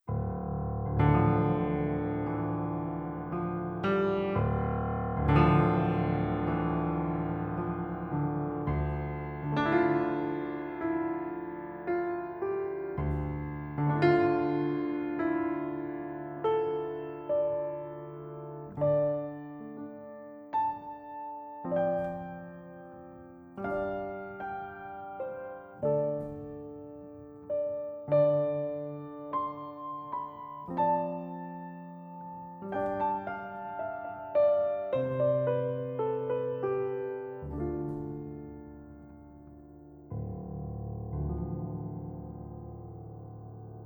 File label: Piano Interlude; NetHack Legacy